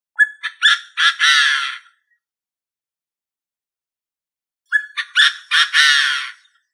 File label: Chinese Francolin